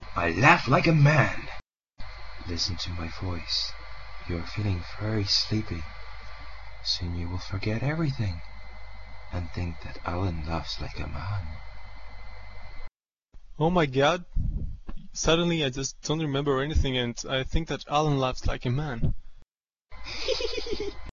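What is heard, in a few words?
Laff Like A Man, voice clips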